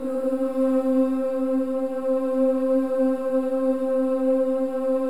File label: BOYS OOS; Spectrasonics; Symphony of Voices